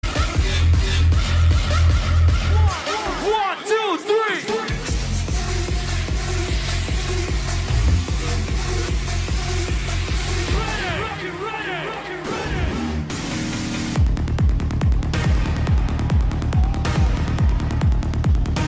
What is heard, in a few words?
trance ringtone; tomorrowland